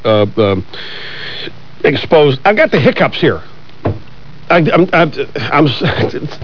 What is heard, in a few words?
hicup